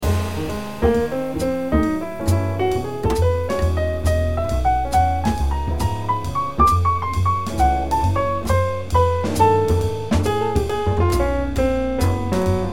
Piano Solo Transcription Trio

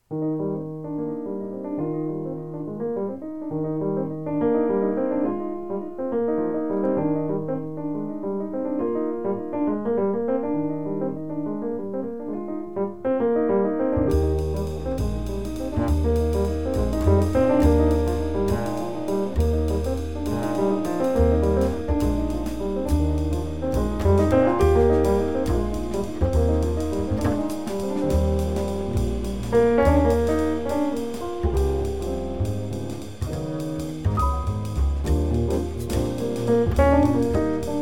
waltz trio mix

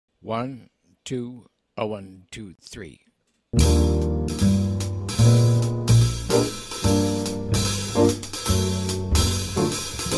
trio minus melody

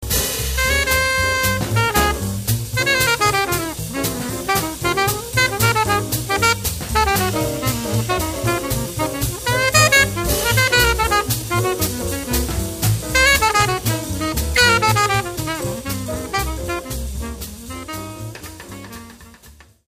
solo

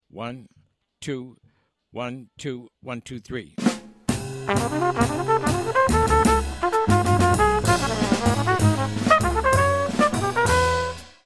FAST minus piano

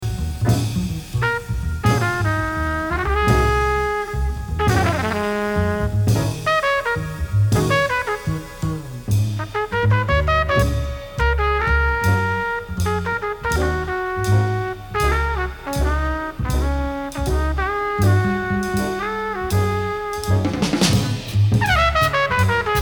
trumpet solo

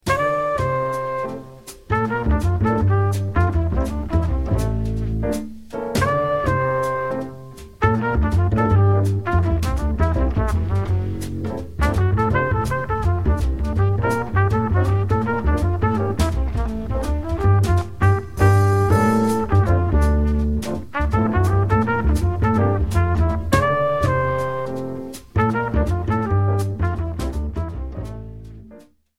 Quartet

